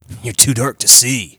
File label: taunt; billy